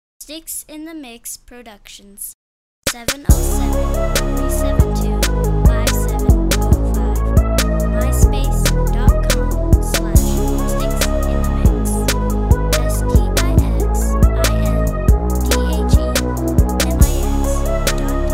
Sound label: dirty music